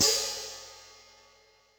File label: Hi-Hat, Yamaha DTXpress